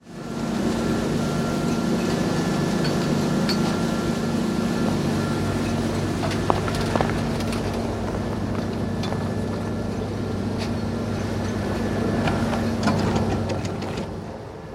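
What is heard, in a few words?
snowboard sounds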